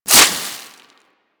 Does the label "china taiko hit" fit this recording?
No